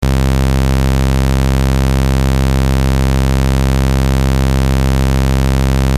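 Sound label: Filter Sweep In; Eurorack; PitchShaper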